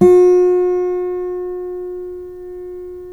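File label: GUILD 12-string, Guitars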